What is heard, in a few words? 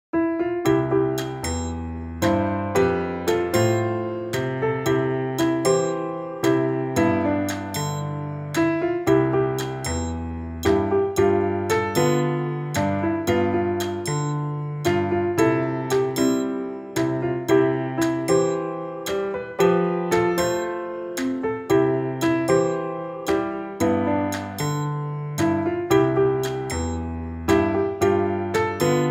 piano; I Have a Testimony